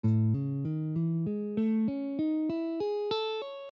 A Chinese, guitar

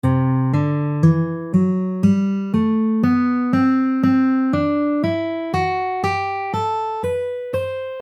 D Lydian Modes

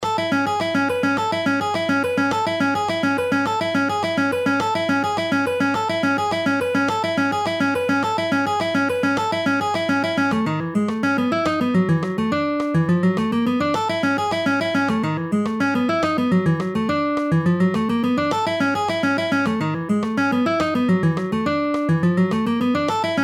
Guitar Dynamics

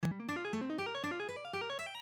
Diminished Guitar Lick

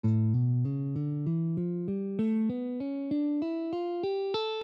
A Hindu, guitar

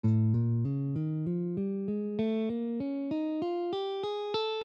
A Enigmatic

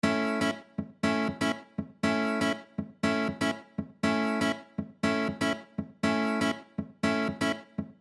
Rhythm, guitar

